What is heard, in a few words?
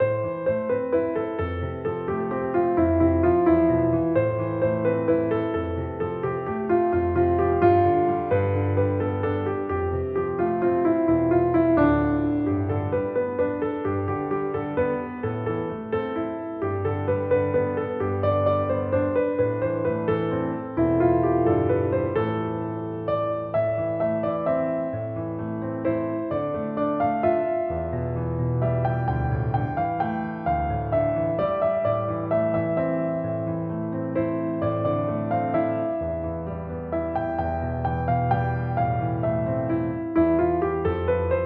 piano